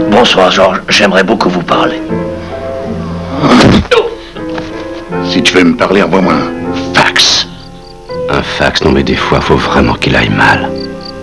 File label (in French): classe fax